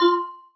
marimba; ゆめ2っき

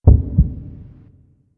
heart; ゆめ2っき

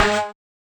marimba steeldrum; ゆめ2っき

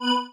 marimba spacesuit; ゆめ2っき

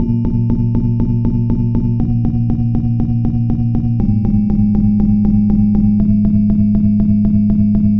bgm, Music; ゆめ2っき

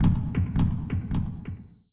teleport

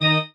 marimba grave; ゆめ2っき

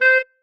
marimba penguin; ゆめ2っき

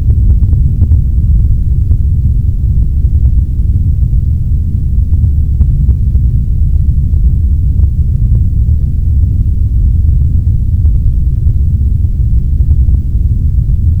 brown Noise; ゆめ2っき

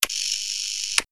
dial; ゆめ2っき

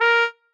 marimba trombone; ゆめ2っき

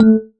marimba raincoat; ゆめ2っき

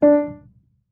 marimba piano; ゆめ2っき

